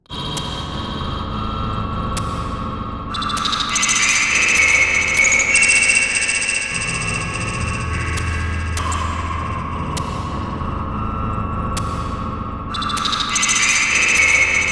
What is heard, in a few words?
Siren Song